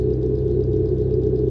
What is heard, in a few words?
Low Deceleration; AudioSpeed